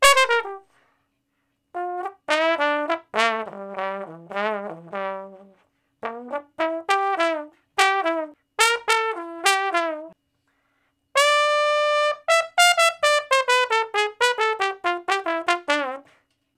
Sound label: Trombone Slo Blus (G IV, IV, I, I, V); Solos; Crimson Blue and Fabulous Horncraft 4 RnB; Horncraft for R&B